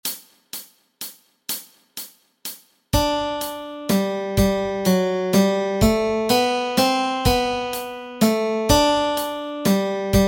melody

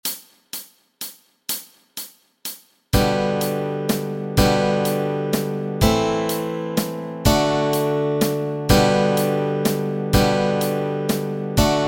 chords

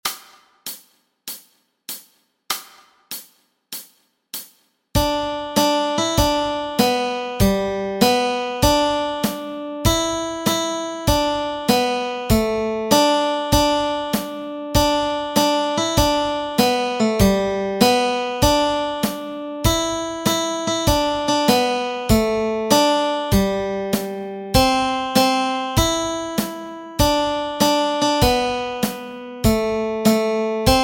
Up on the Housetop melody

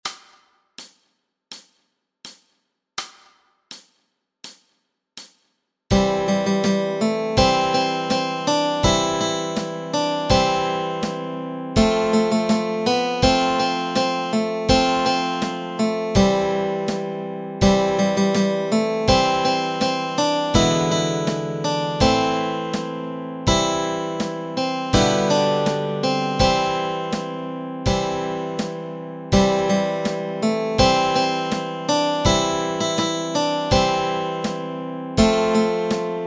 Do Lord melody and chords

